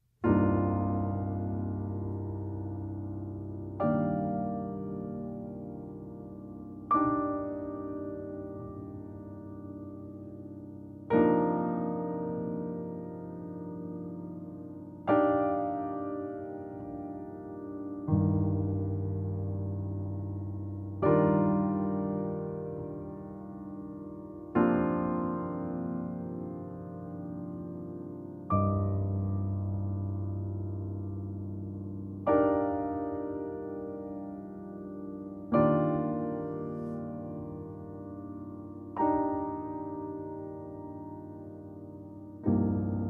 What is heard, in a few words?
Cmin7